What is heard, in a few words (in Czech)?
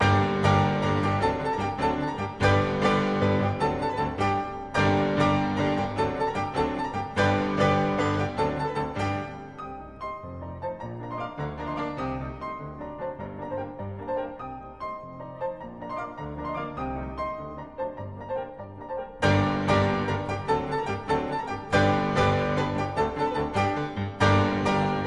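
Slovanský tanec g moll Presto (Furiant), varianta pro čtyřruční klavír; č.8; 1878; Antonín Dvořák; op. 46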